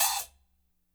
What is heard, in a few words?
hat, micro kit; Spectrasonics; Burning Grooves CD2